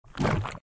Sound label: Ink Jump; Fancy Pants World 1 Remix